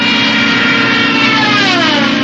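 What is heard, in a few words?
whistle of a diving bomb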